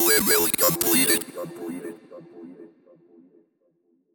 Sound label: level completed